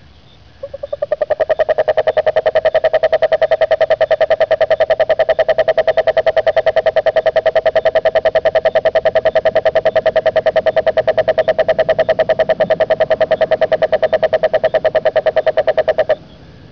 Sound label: giant toad call; texas nature trackers; amphibian watch